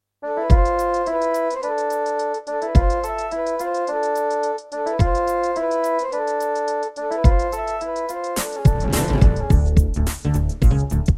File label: Egypt, Yamaha DTXpress